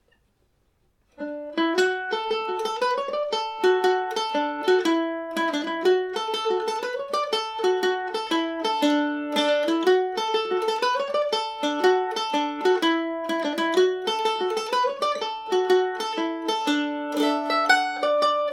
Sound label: slide, irish mandolin; templeglantine